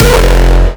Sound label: kick oneshot monster E; GhostHack